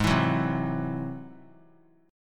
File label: Ab Augmented 7th Ab down; Ab+7 strummed; JGuitar